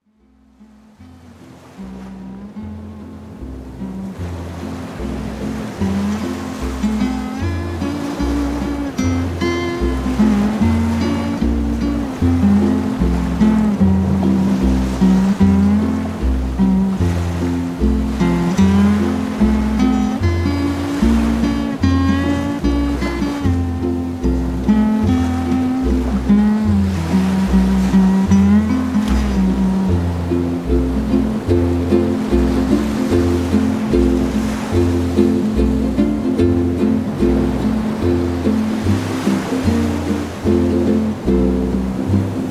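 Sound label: Zvuk Moria, Zvuki I Shum Moria, Okeana, Voln, sea sound, sounds and noise of the sea, ocean, waves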